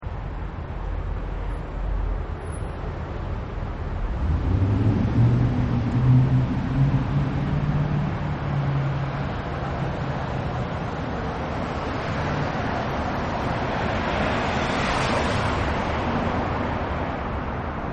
Freeway Underpass, Ambience Recording Of Freeway Underpass With Various Cars Going By; Traffic and Cars; Michael Huang